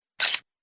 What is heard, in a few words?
slr camera shutter